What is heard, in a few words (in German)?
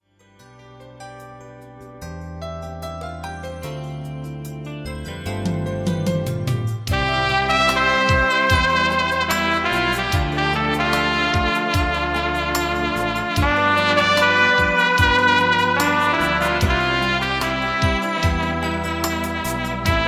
Klangprobe